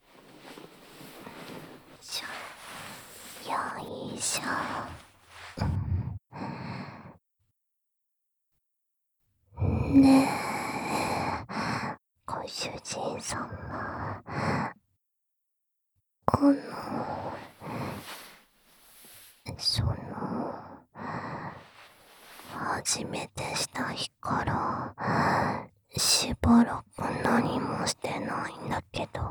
耳舐め・本番・フェラ・一緒に乳首舐め（囁きブースト 効果音大きめ 射精音なし）, ASMR; 発情期マタタビでふにゃふにゃドスケベご奉仕; ダウナー猫娘; KU100収録

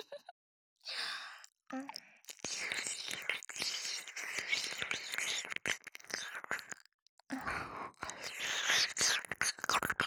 左耳舐め, ASMR

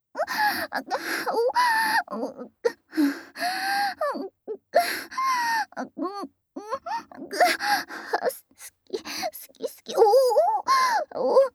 好き好き連呼オホ声えっちループトラック, ASMR

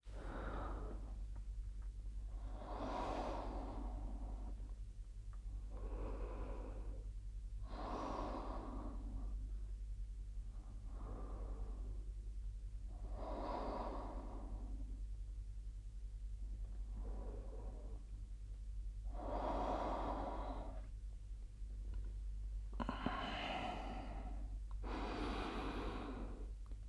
寝息声; 哄睡

